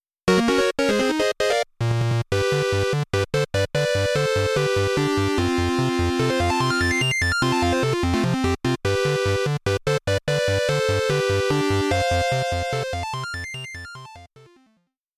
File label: Item Get Jingle; Dancing Blade Katteni Momotenshi 2 Tears of Eden